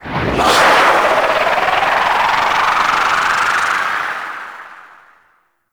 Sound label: Archwing Healer Engine Boost Slow Reverse, Vehicles; Warframe